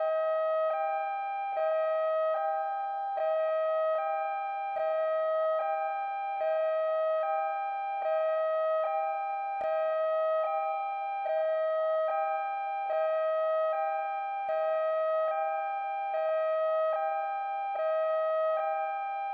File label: Police Emergency Warning; Helicopter; crashes bombs misc; Zomboid; Expanded Helicopter Events